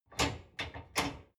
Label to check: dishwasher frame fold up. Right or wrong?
Right